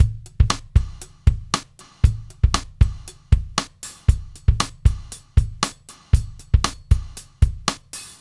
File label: Stealth 117; Zero-G; Monster Beats